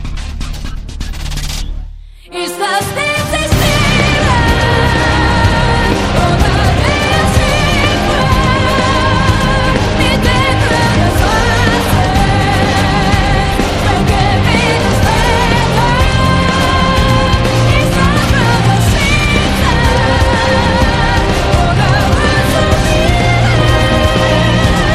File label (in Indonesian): Nada Dering Anime; Attack on Titan